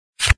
card pickup

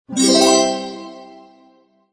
hud new cards

